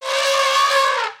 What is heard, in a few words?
Pets Elephant Happy; creatures; Lego